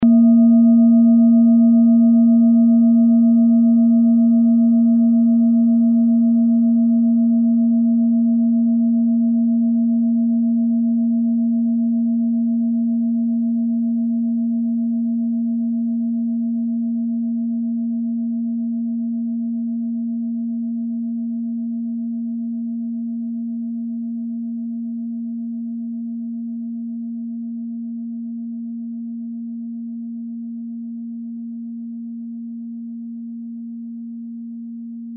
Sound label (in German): klangschale ladakh